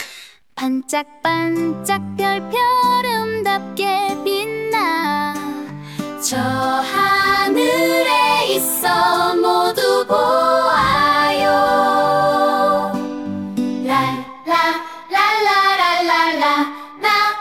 nursery rhyme M; acestep

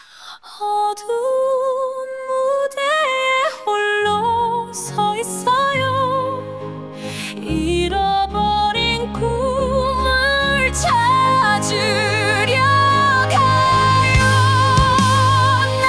musical anthem F; acestep

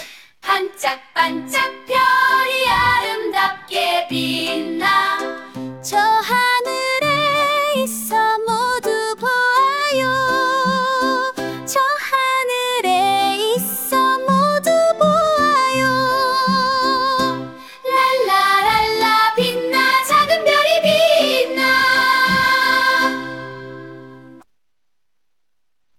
nursery rhyme F; acestep

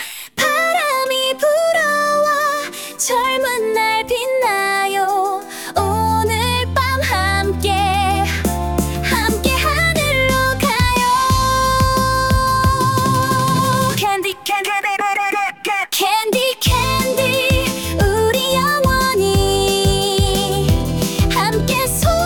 late90s dance F; acestep

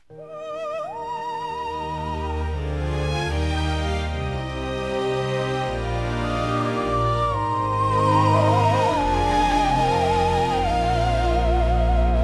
musical anthem M; acestep

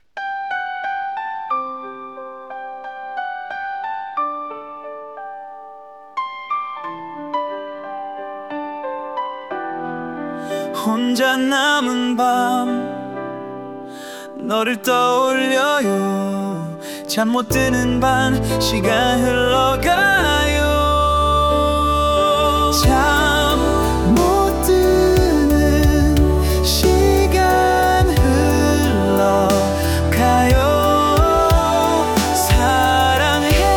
modern ballad M; acestep

